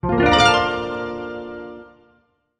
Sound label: Music Effect Synthe, guitar